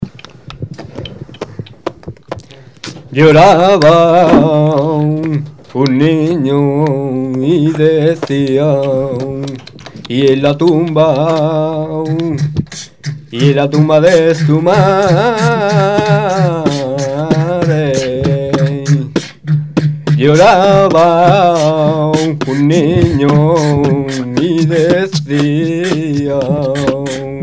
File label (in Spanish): sesion box flamenco, Beat Box; Taller Beat Box